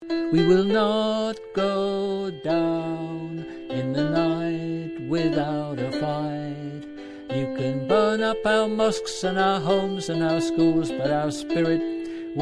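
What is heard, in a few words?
chorus alto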